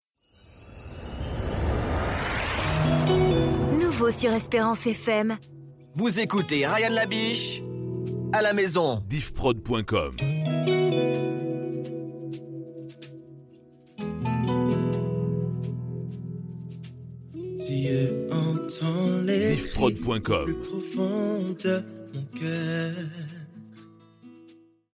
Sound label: Power Intro; Esperance Fm